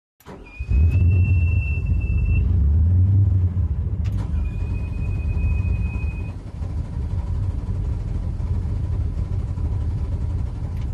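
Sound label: Power Boat Interior Start Away, off shore power boat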